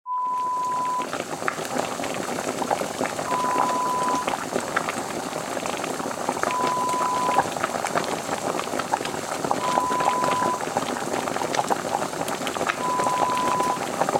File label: Bubbling Cauldron Horror Sound Effect